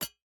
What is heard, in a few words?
environment pickaxe hit iron